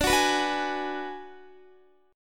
D 6th Flat 5th, Guitar; D6b5 strummed; x,17,18,16,18,16

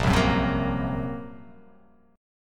A sharp Suspended 2nd Sharp 5th

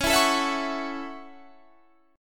F sharp Minor 7th, guitar

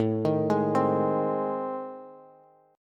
A Minor Major 7th Flat 5th, Bass; arpeggiated; AmM7b5; JGuitar

